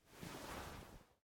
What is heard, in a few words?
Repose; game audio; Mita; MiSide